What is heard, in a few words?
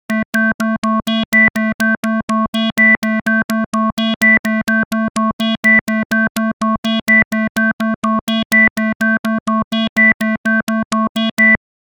filters detuned; obx; vst audio; opxvst